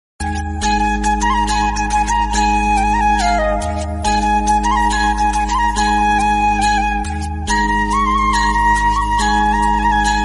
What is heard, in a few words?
flute instrumental ringtone; ramayan